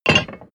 metal clank massive close; industrie-wirtschaft

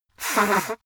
nose blow dry; soundpool

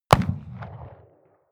revolver shot 44cal remington 25m rear; schusswaffen

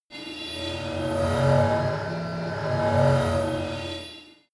energy swell electronic low; energie

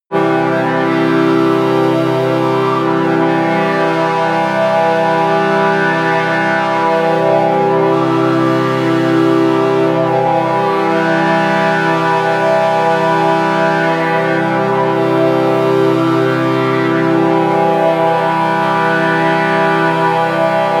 organ air

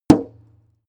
metal impact bright muffled

